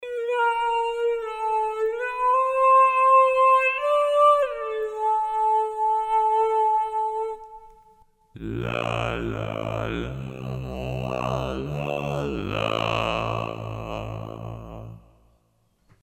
tc helicon perform ve morph shift

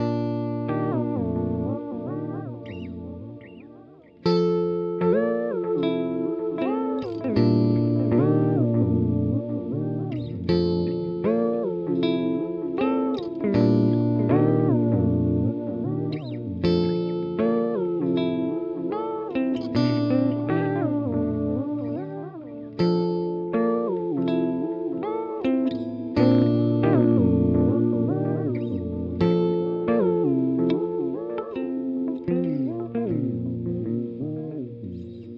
Thermae Modulation Step; Chase Bliss Audio

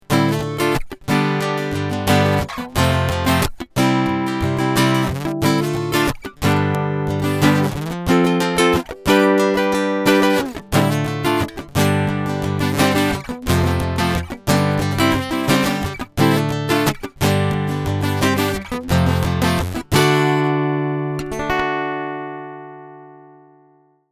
PRS SE P50E BG Strumming Line Signal

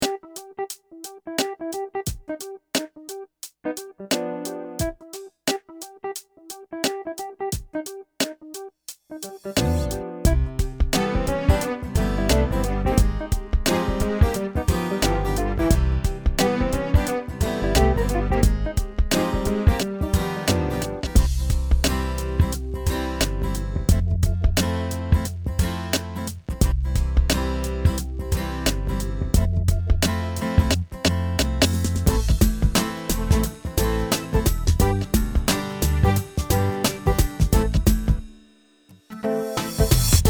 PA600 Styles

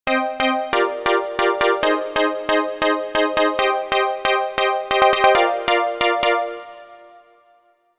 Yamaha Genos2 FM Trans EDM

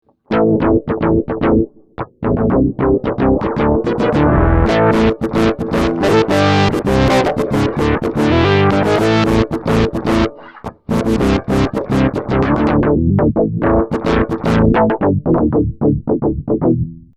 Electro Harmonix Bass Mono Synth X Fade Wet

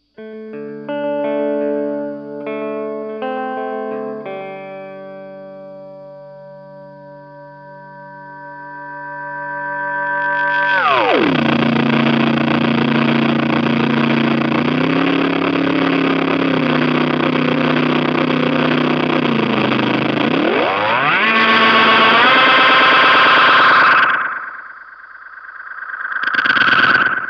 Micronoise

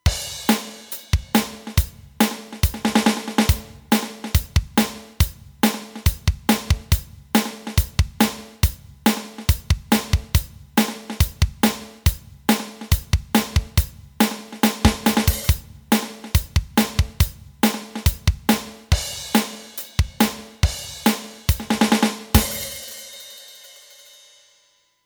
Beat

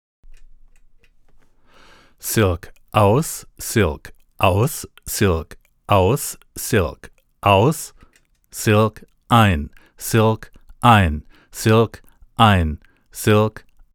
Rupert Neve Designs Portico 5017 Silk on off

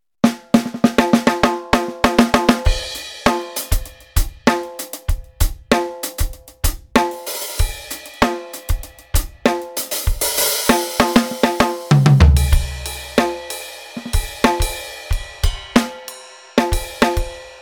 Kit 5; EFNOTE 5; Studio F

